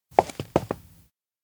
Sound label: steps outdoor town step out; fumiya; anemoi